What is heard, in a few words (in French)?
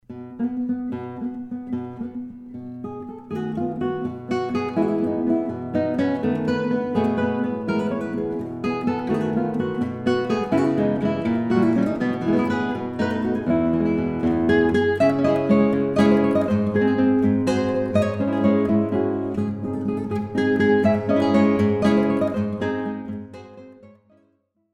Fantaisie pour 2 guitares Allegro dans le genre espagnol